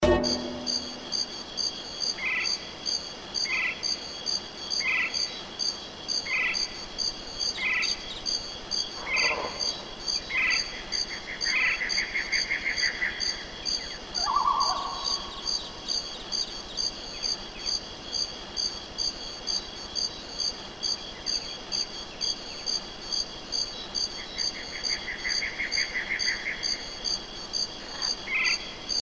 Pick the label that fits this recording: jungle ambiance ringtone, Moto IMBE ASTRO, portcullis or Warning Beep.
jungle ambiance ringtone